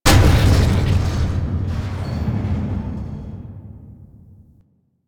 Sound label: Blast Door Close; Warframe; Ambience; Nineteen Ninety Nine